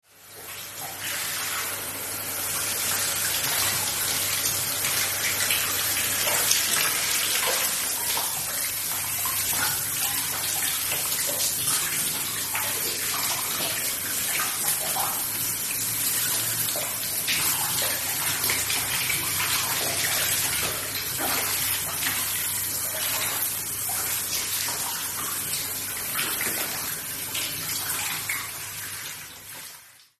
hand shower bathing sound with flowing water and gentle splashes